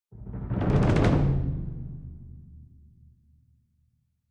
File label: drum on